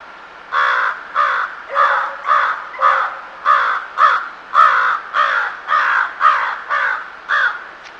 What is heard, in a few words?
bird